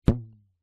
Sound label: elastic ribbon twang; foley